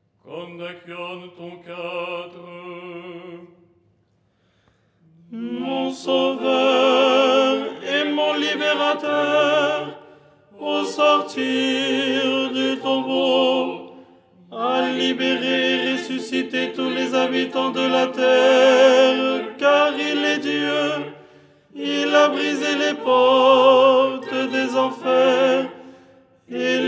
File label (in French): tenor; Kondakion du dimanche; Les 8 tons en français; ton 4